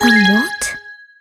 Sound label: surprise alt; kira